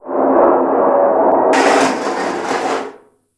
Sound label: metal scrape